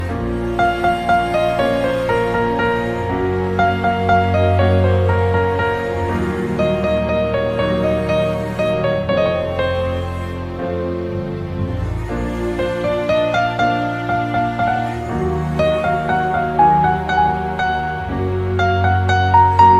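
Heart Touching Mother Song background music Ringtone; KGF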